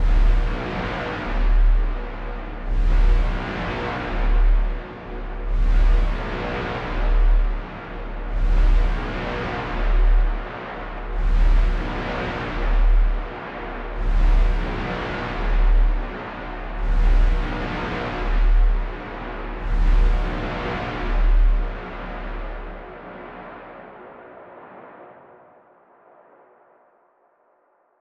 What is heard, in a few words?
Ice Doom Loop D 85bpm